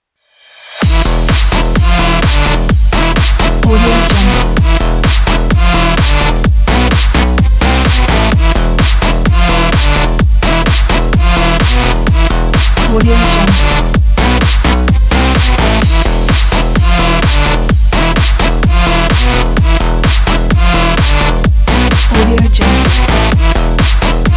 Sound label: intro electronic